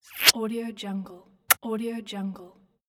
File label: kiss